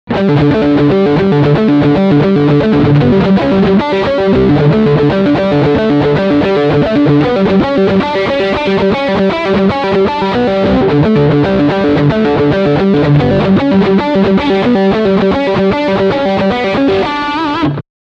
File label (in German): Lick beide Humbucker